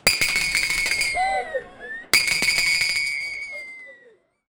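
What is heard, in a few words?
a 5 second carnival celebration, shaker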